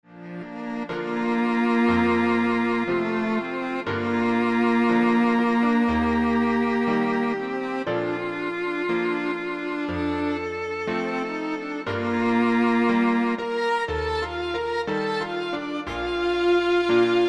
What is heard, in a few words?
Piano Trio